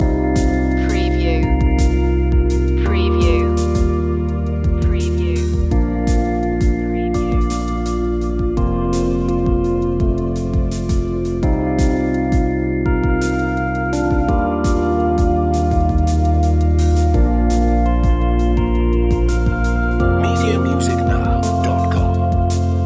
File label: growing strong loop